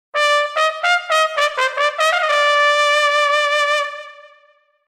trumpet ole

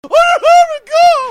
bams yell

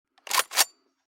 glock

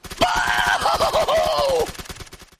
woohoo

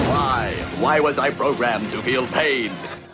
pain short

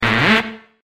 wuh eh

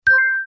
chime daily bonus

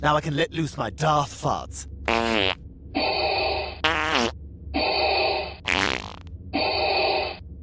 farts

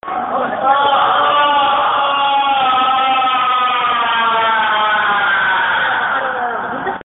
arrrrggggggghhhh ahahah

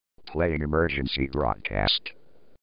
playing emergency broadcast

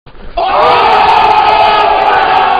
ohhhhhhhhh

